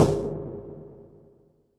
snaredrum, Pearl SC-40

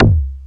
FM Perc; Rap

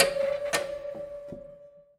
Prepared Piano; Battery 3 Sample Kits

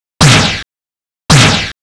Snare, Trance; Sonic Foundry (Sony Creative Software); Trance NRG VOL-1; Drums

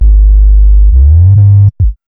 B-LOOP; X-Static Goldmine 1

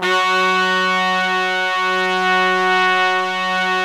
BRASS SECTN, Pop & Funk Brass; Best Service ProSamples vol.25; AKAI

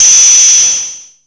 WN FM HIT, ANALOG PERC; Zero-G Ambient 1

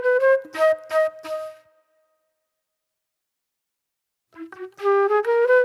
Wild Flute; Sonic Foundry (Sony Creative Software); Manhattan Flutes

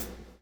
BLUE FB, BLUES; Spectrasonics - Backbeat

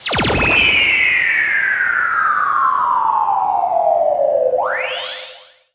Industrial; Sonic Foundry (Sony Creative Software); Underground UK House & Electro

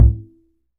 E string upright bass; Upright Acoustic Bass

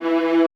QVIB F E2, Orchestra; AKAI Advance Orchestra Upgrade 97 Vol.1

